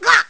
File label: Roll Smack; Link; zelda